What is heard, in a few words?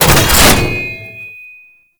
Tank Cannon Charge Start; Enemies; Weapons; Lasrian Tank; Warframe